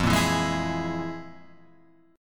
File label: F Minor Major 7th Double Flat 5th, chord